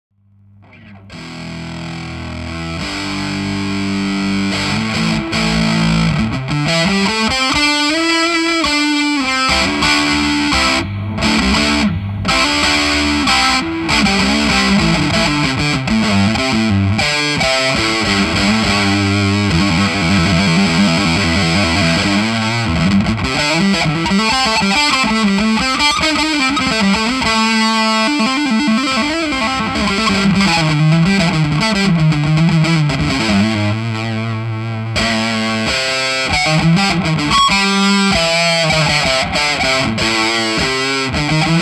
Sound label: tone; big soco pi